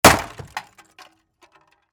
Impact Automobile Grill, Hit, Smash; Crashes (Sound Effects:Objects)

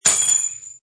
Metal Pegs drop; Metallic Sound Sound Effects

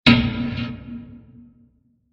Metal Bowl Impact Blast; Impacts; Crafted Signals

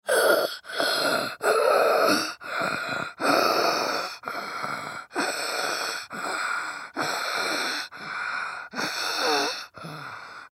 Human Wheeze, Wheezing Long Male Twelve Yrs Boy; Male Twelve Year Old Boy; Blastwave

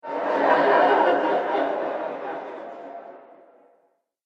Horror Surreal Laughter; Evil Laugh Sound Effects

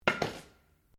METAL ON GRAVEL IMPACT GENTLE; Materials

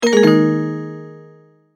multimedia tone end

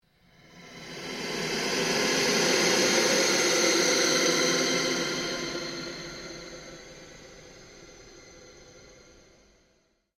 PULSE LONG SWEEP

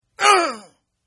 Grunt; Grunts; Voice; Partners In Rhyme

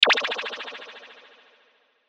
Sci Fi Sonar Ping Single Blip Delayed

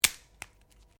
Branches Dead Snap; Material components for constructing more complex sounds; Snaps (Sound Effects:Objects)

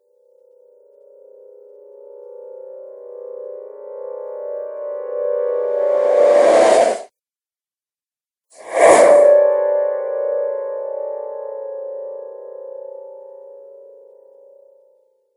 Horror Trailer Whooshes

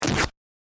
Rip Fabric Ripping; Ripping Sound Effects

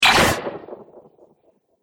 Laser Future Droid Repair; Laser Sound; Sci-Fi Laser

